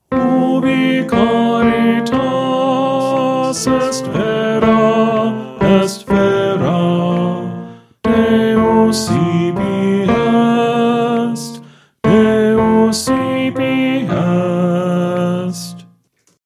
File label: Ubi Caritas Tenor